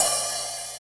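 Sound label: hi-hat, hi-hat real; Sound & Vision - Gigapack I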